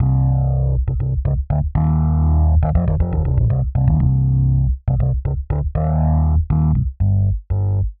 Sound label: Rhodes Bass C; PowerFX - Nu Jazz House